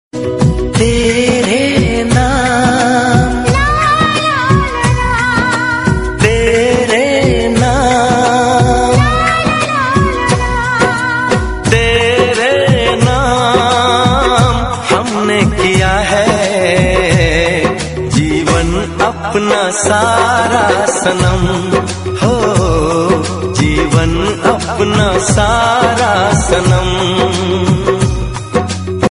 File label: Sad, Bollywood